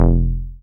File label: 303, TB-303 bass